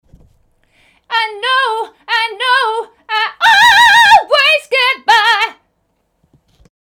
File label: Scream